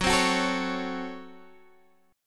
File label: C sharp Major 7th Suspended 4th Sharp 5th F sharp